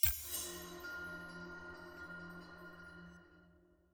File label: ui page upward click; spirit blossom